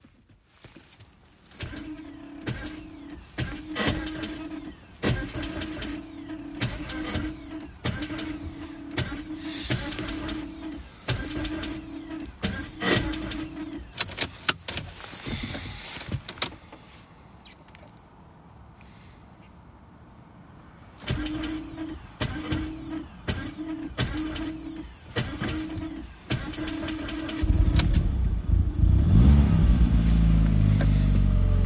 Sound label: a4 1.8t rough crank cold start